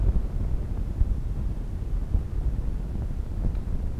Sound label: noise